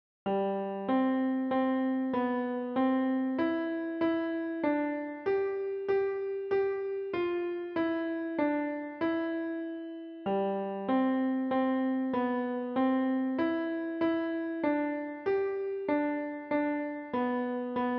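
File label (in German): Hark The Herald Angels Sing Klavier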